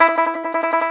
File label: T. BANJO, Tenor Banjo, Plucked Strings; E-MU Producer Series Vol. 7 – Old World Instruments